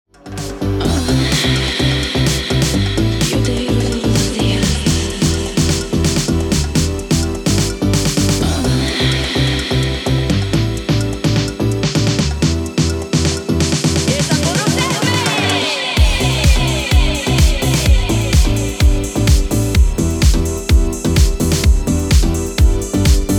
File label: Piano Italiano